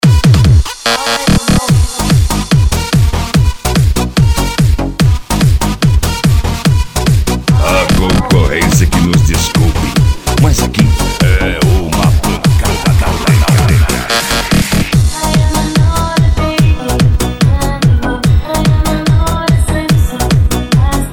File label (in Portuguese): musica eletronica